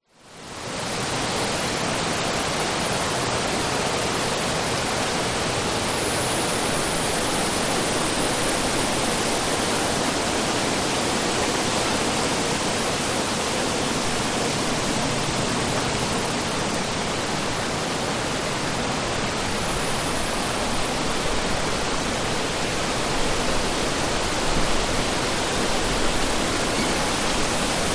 nature spring rural day crickets birds flies forest pt glorious australia; zapsplat